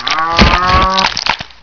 bull whip; svencoop; hunger

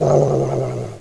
pit drone idle; svencoop

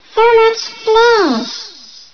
alert; hunger; babyslave

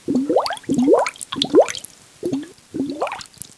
bubble; svencoop; hunger

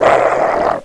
pit drone die; svencoop

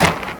dirt; svencoop

